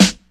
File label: snare